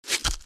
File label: Hit by arrow